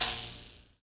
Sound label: Mouth Bow, Africa; Roland LCDP11 Africa VOL-1; Mouth Bow 2